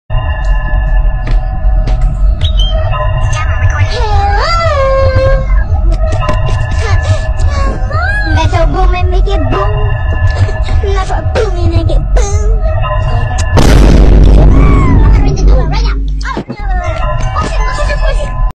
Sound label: goofy doorbell